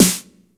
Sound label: SN TR, DRUMS; USB Soundscan vol.02; Underground Hip Hop; AKAI